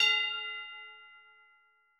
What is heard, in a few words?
F6 bell